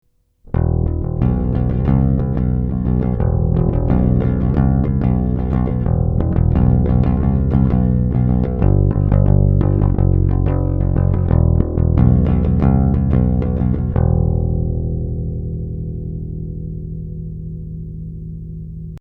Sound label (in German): Bass direkt